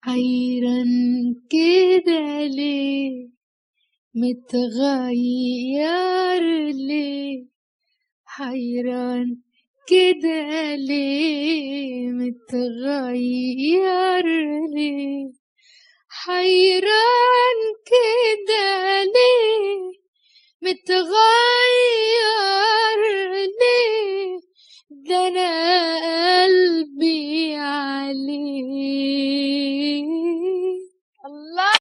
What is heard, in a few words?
solo